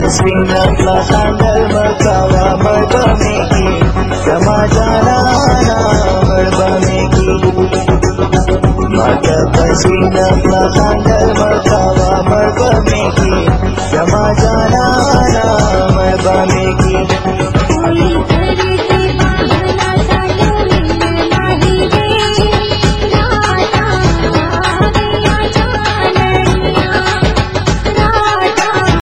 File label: pashto urdu mix; ringtone